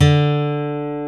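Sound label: acoustic guitar, country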